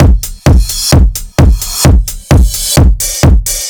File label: Distorted Drum Beat 130, distorted drum loops 130bpm; DD Beat DOA